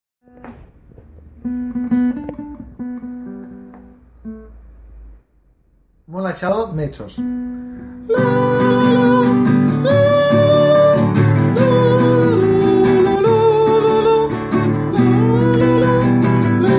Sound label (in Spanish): voz mezzo